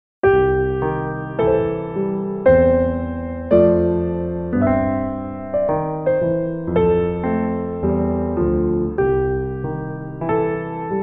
Penguin Blues duet

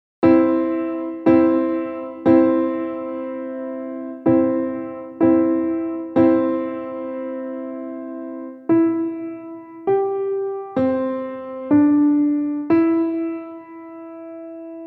Jingle Bells

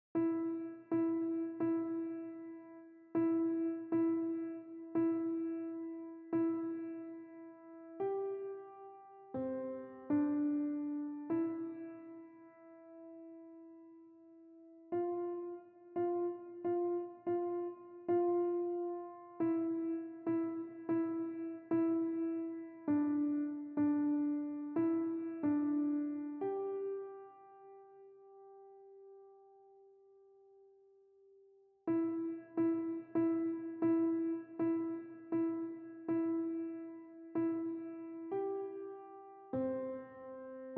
Jingle Bells

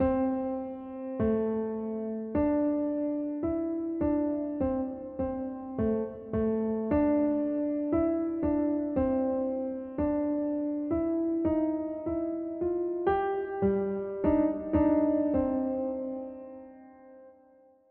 JazzWalk

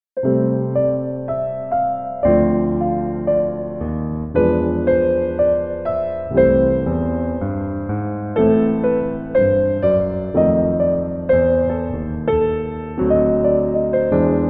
Hound Dog Blues

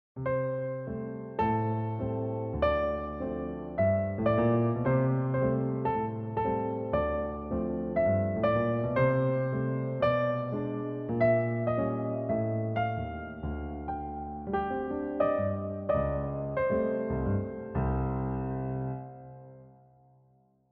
Jazz Walk

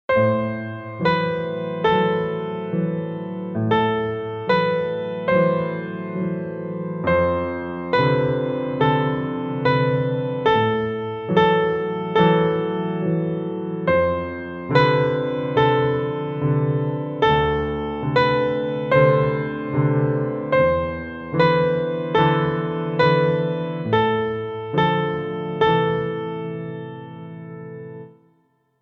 Duet

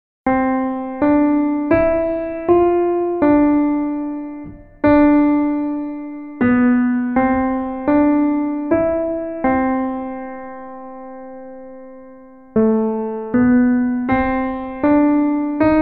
Hound Dog Blues

